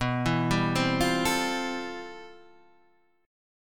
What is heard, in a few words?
C Major 13th B